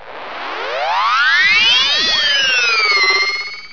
mine charge

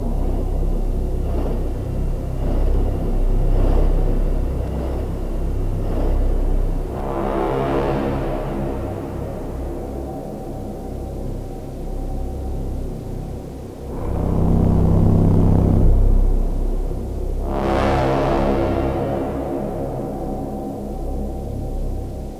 hell music; cyrax

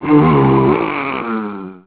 zombie attack, male

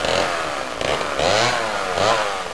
chainsaw idle

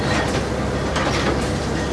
heavy machinery

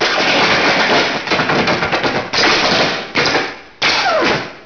electric

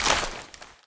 hk416 deploy; cso

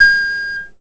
small beepactivate

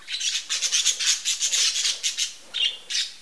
birds

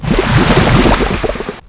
sewage exit

